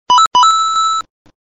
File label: short alert tone; ringtones; sms